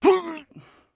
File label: hit; Escape from Tarkov